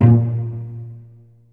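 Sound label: cello pizzicato A#1, Virtuoso Strings; ILIO; Kirk Hunter Virtuoso Strings VOL-3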